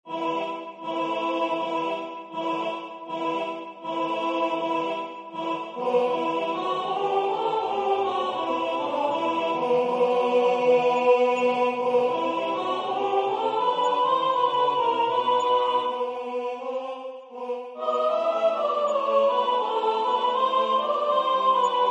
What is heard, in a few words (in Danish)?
sopran; Der Panther